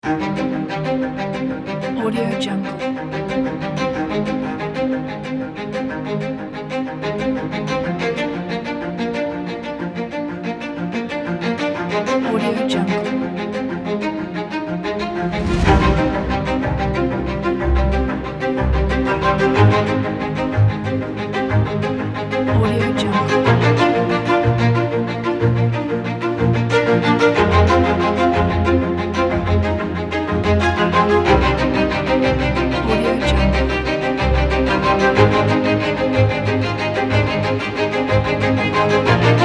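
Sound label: commercial classical cello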